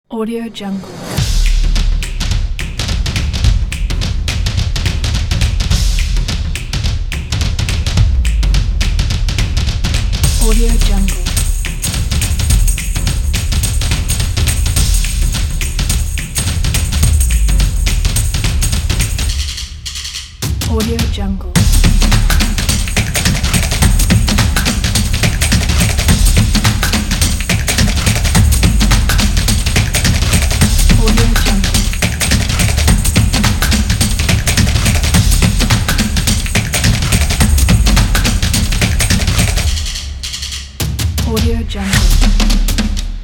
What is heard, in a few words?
the drum; positive-sound